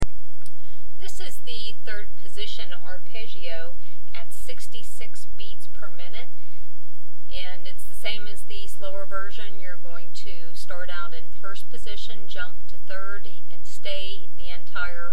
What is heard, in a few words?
Third Position Arpeggio 66bpm, mandolin